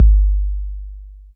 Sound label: K 808, KICKS TR 9+8